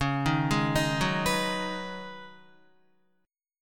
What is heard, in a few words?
Db 7th Suspended 2nd Suspended 4th, Guitar, Db7sus2sus4 chord; JGuitar